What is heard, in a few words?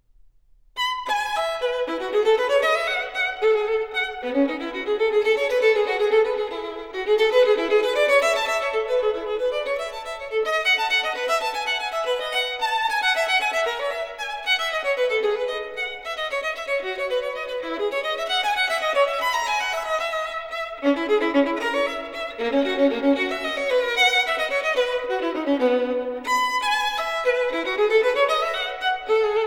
Partita for solo violin in E major, Gigue; Partita for solo violin No. 3 in E major, BWV 1006